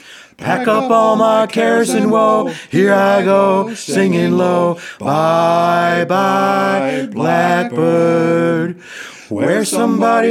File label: Vocal Take Two Amp Comp